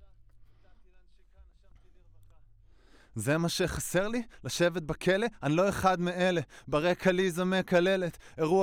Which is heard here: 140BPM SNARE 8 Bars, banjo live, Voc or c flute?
Voc